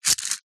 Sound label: leisure toy aerial disk launcher cord pull spin